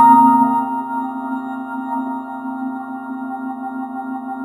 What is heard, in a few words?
BELL, Synth FX Misc; E-MU Producer Series Vol. 8 – Platinum Phatt